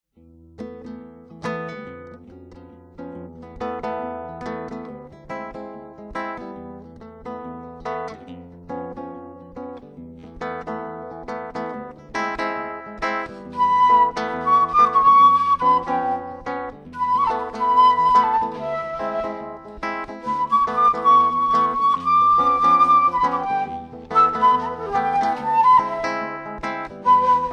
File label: Lemongrass FLUTE SKETCHES